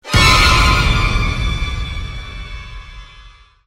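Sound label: Music Stinger; Mx Fodder 02 E3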